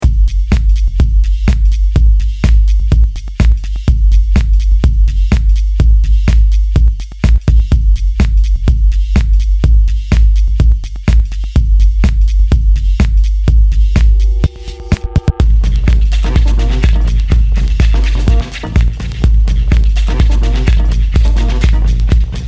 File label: Dance Minimal Dark